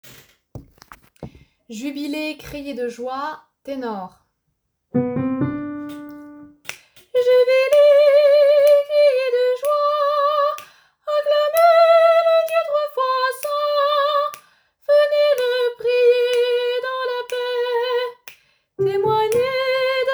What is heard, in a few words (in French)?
Jubilez criez de joie tenor